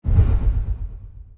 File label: echo, footsteps; elder titan